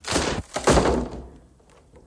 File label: Tree Fall Most Wood Sound, Not Much Branch